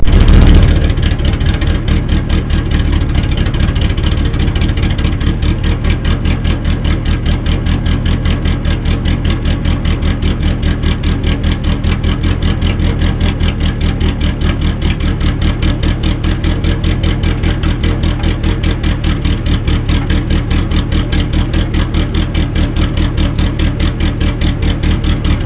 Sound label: racecar cam idle